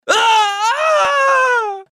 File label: scream, male; gmod; npc